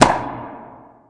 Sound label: shot; VirtuaTennis